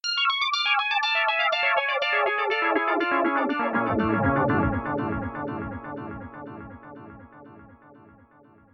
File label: arpeggios soft arpeggio